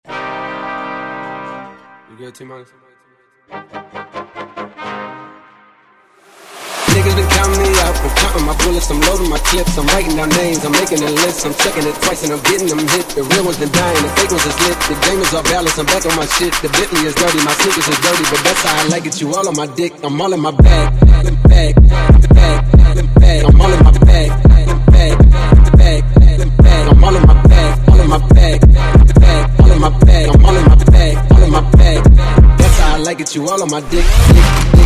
bootleg

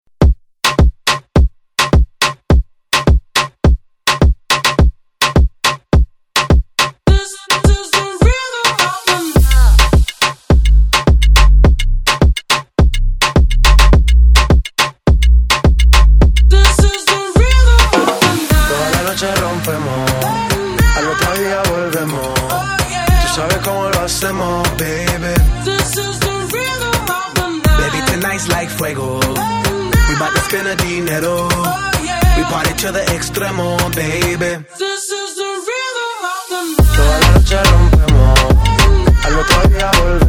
rhythm remix

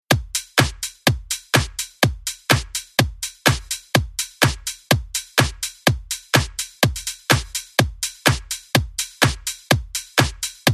Remix

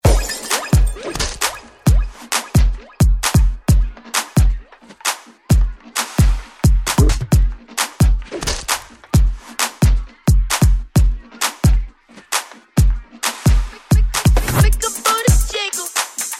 Remix

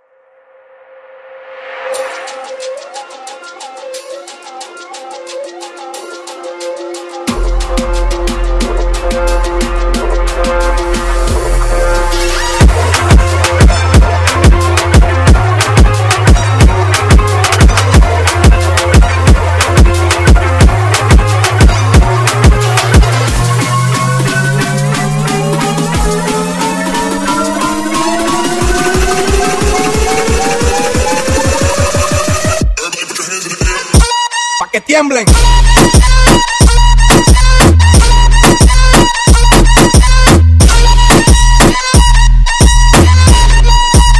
Remix

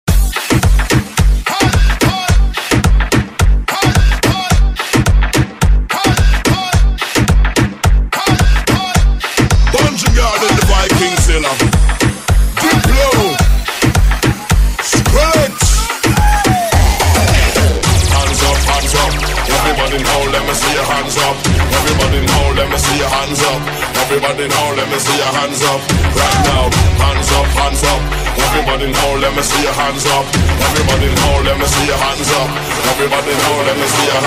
108 128 BPM Transition

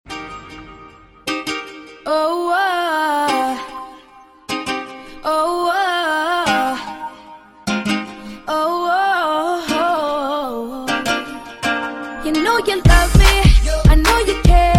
808 gong

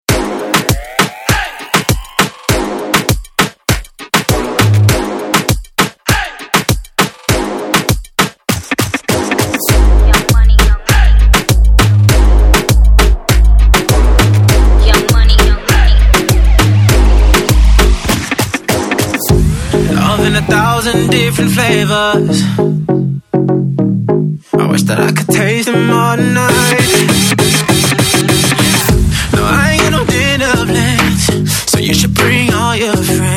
Moombahbass Bootyleg

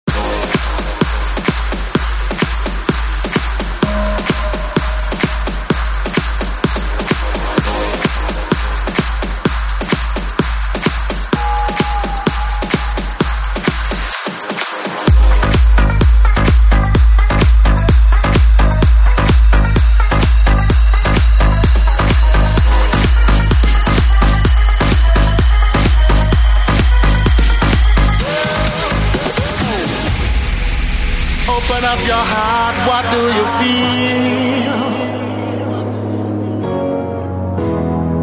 SmashUp

